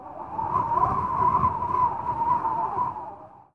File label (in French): vent